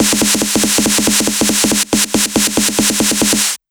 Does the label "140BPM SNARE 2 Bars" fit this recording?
Yes